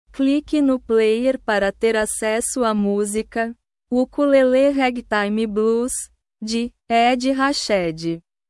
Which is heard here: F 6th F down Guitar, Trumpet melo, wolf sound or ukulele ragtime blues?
ukulele ragtime blues